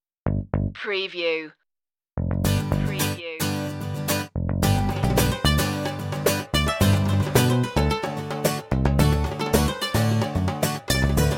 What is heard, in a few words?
morocco souk soft